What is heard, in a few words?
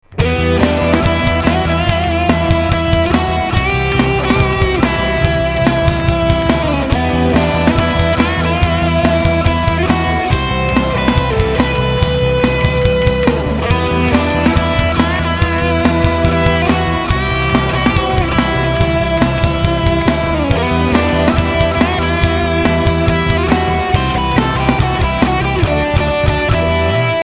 Solo